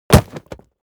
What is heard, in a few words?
impact body fall concrete dry